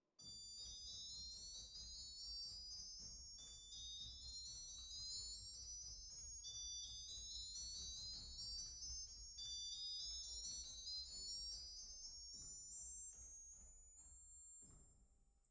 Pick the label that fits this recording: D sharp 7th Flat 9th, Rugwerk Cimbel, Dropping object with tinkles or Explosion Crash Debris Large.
Rugwerk Cimbel